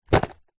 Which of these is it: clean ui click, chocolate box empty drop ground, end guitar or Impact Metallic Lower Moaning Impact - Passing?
chocolate box empty drop ground